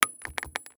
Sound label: shell 39mm carpet; STALKER; IWP